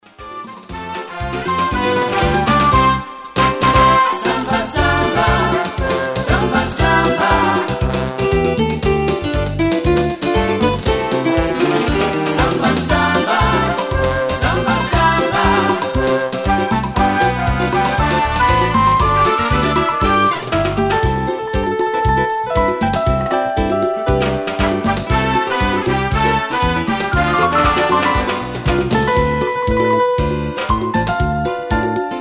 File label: samba samba